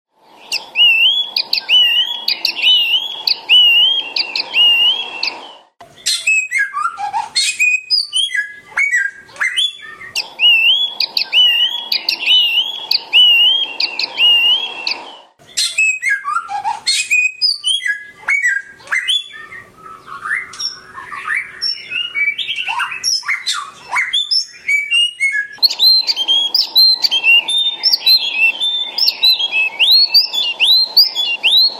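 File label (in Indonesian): kombinasi samyong tiumi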